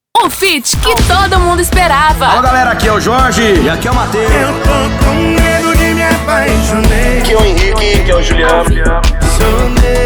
INTRO MUSIC; MEGA FM

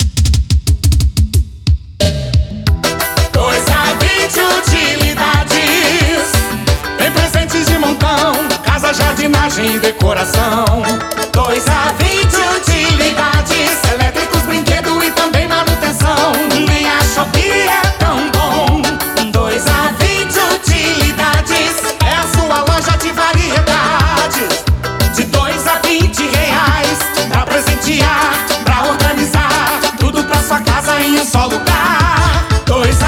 JINGLE COMERCIAL; UTILIDADES; ALVIM PRODUTORA

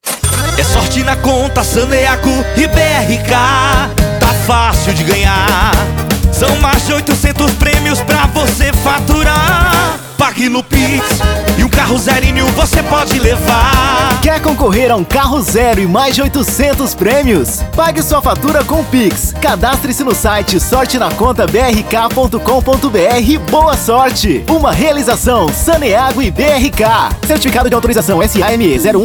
JINGLE; SANEGO E BRK REFACAO; ALVIM PRODUTORA

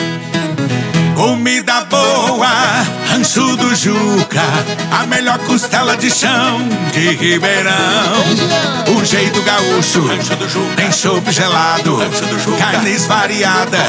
JINGLE COMERCIAL; RANCHO DO JUCA; ALVIM PRODUTORA

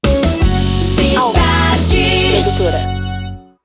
vinhetas cantadas; ALVIM PRODUTORA

